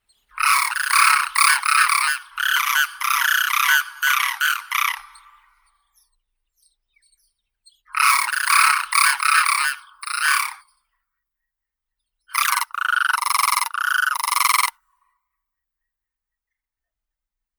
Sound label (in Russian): zhuravl malii krasavka anthropoides virgo; zvuki prirodi